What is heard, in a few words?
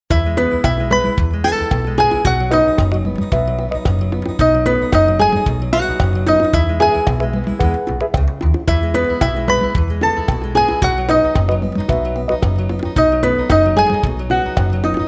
pokemon center; pokemon x y